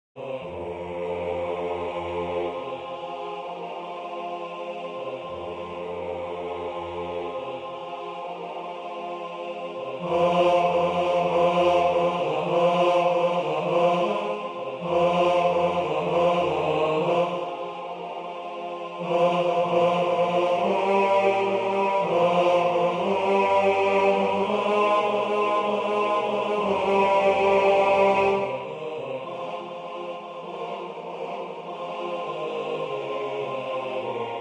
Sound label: Solo